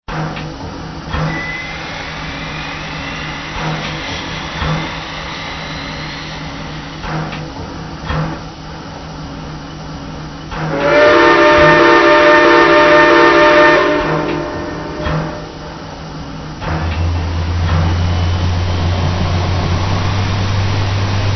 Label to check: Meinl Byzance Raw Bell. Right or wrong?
Wrong